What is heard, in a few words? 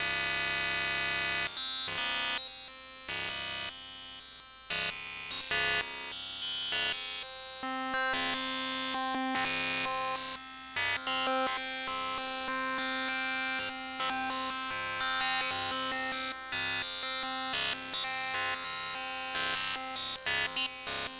shamisen kayamba; snap garama